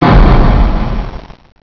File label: bill blow